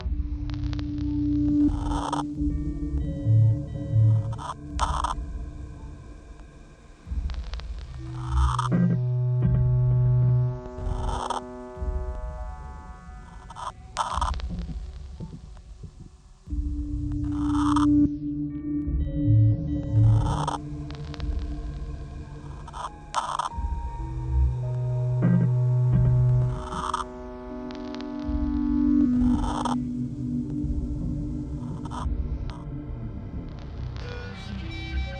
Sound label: radiator; The Submachine Series; submachine 10